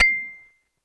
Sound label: ASI KOTO C6, Yamaha VP-1, ASIA-KOTO; Music Store; 90's Sample CDs